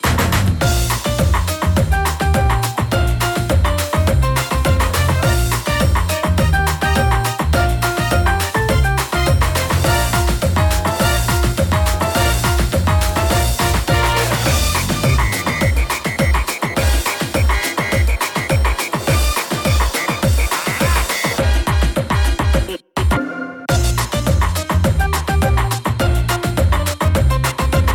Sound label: Jingle Menu; PS Vita